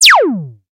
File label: Space Shot Piu